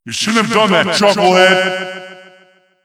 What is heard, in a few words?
backstab; tf2; freak fortress 2; the madcap